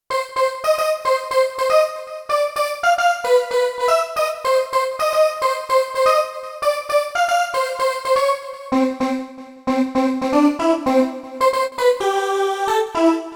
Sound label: Roland D-70 Lead Vox; Factory Performances